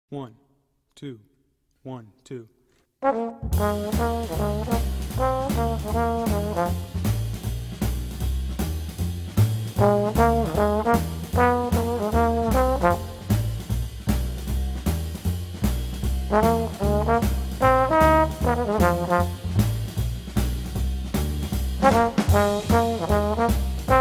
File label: Shufflin in F without piano